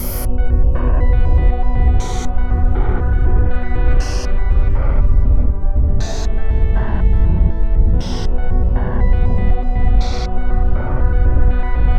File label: New World Intro